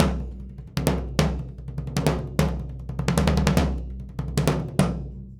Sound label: 100 SURDO; Univers Sons; Basicussions